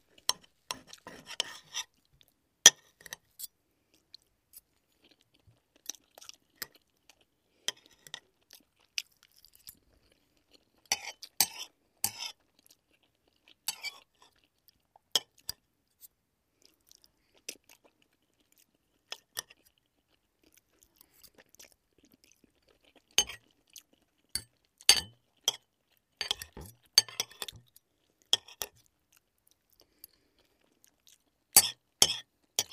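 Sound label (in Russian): upotreblenie pishi stolovimi priborami, eating with cutlery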